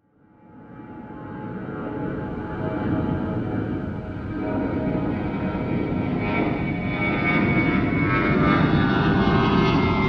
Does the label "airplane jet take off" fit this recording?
Yes